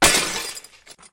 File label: Windshield Shatter; Lethal Company